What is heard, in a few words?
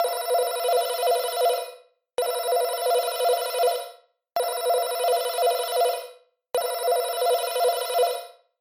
Warning Alarm